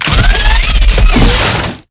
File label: Drone Missile Death; blue archive